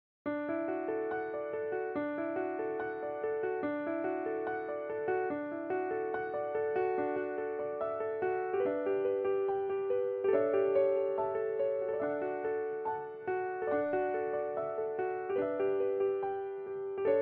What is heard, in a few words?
instrumental; amp regional arts and media program